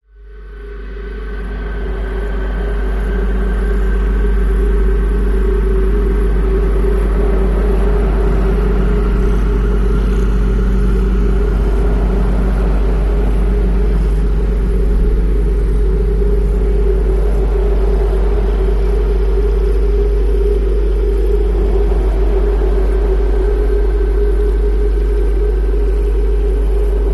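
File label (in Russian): портал звездные или космические врата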